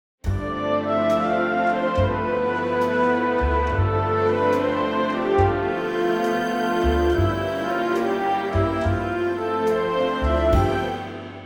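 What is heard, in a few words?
orchestra C; key - C - vocal range - Db to F (optional G); Gorgeous orchestral arrangement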